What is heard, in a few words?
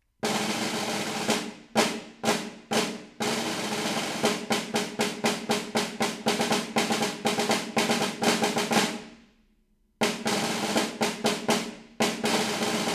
snare drum 120 bpm